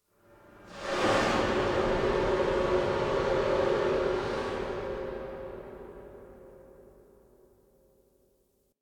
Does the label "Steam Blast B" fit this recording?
Yes